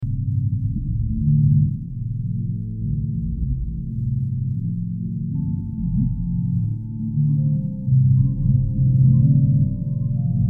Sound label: Organ